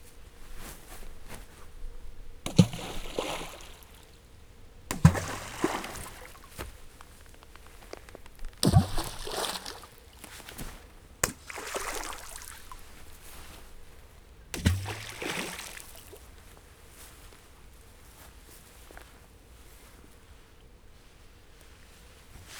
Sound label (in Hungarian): töltés kavics sorozat, vízbe kődobálás; vizvar; cardioid